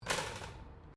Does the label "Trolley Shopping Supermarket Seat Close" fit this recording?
Yes